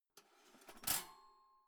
toaster pull in; maschinen

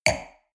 wine glass place table medium short; berufe; soundpool

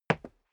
step sneaker concrete single stair down; schritte

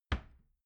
door wood close soft

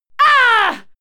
female fight attack hit; schreie; menschen

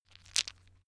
stone gravel clatter short; natur-tiere; steine

